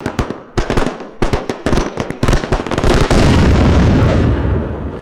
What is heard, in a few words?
rapid firework pops and single loud boom